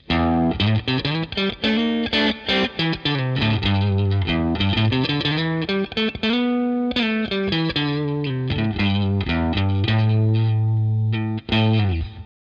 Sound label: tone; tgl